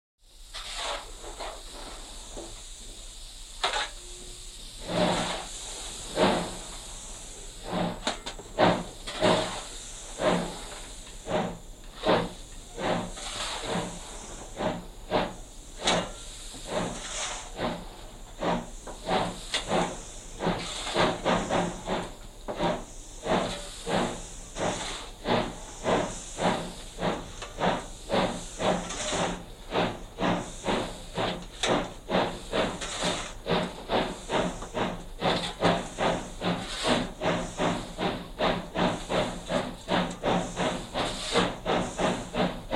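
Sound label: Start Exterior Steam Train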